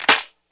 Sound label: clap; Claps